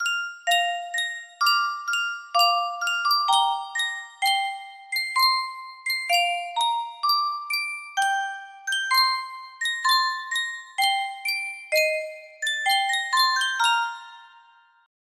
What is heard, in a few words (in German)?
Yunsheng Spieluhr